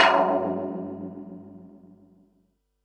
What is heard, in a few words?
Perc, Percussion and FX; Pearl SC-40